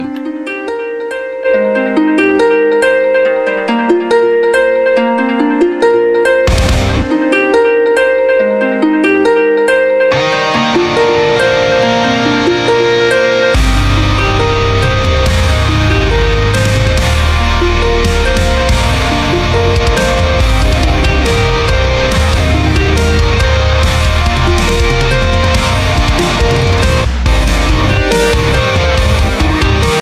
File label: rock tune alarm ringtone